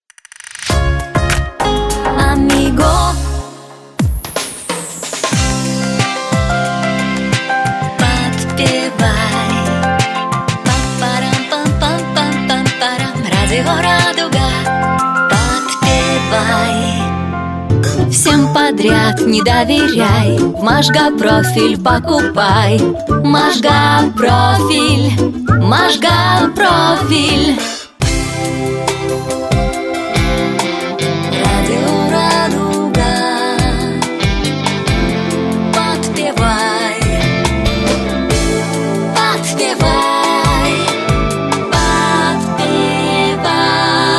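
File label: vocal Russian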